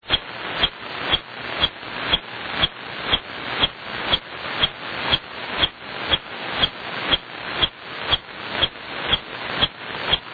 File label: QRN; I have a new source of QRM that is audible on 12 meters only.